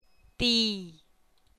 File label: tone